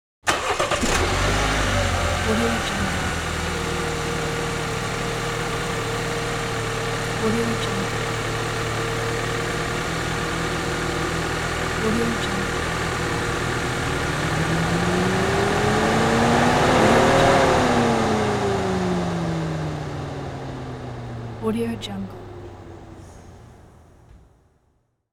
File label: bmw car engine start idle